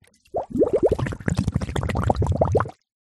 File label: metal canister small submerge deep water bubbles; zapsplat